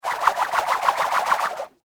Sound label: Warfare Grapple Gun Rope Spool Fast; Miscellaneous Combat